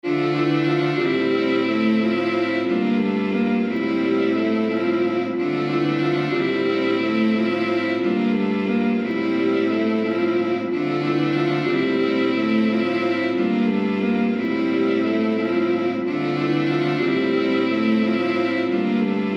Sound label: Strings Wet